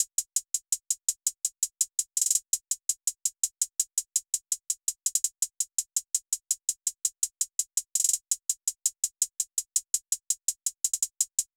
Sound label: Hihat Loop - 166 BPM, Trap; Spain